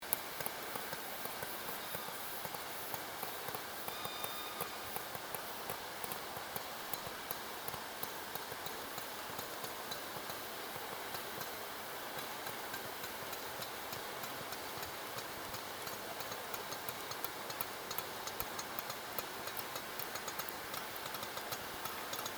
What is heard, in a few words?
Killer whale Clicks